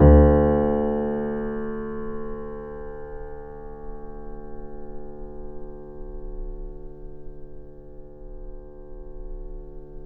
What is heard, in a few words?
PP D 6 off, Grand Piano off mic; AKAI S6000 CD-ROM - Volume 2